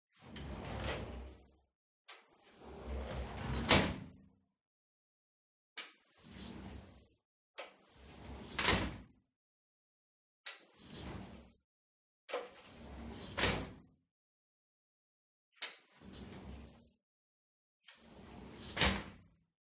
SLIDING DOOR Metal Living Room Open and Close Soft Distant Perspective; gain walkers